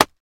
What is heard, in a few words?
Mouse Trap Dull Snap